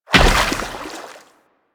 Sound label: Combined Step Water, Footsteps; Enemies; Warframe